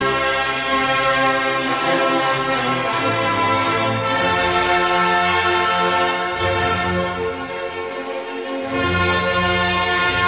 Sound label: fanfare preludes